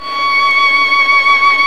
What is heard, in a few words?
STR Violin, Solo Violin FX